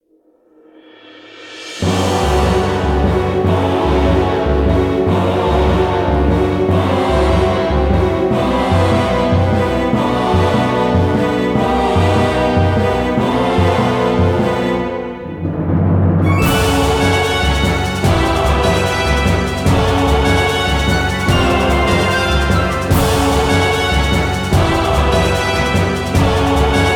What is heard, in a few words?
Bgm battle last prelude